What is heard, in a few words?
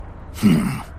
snake hmm; solid snake; openpeon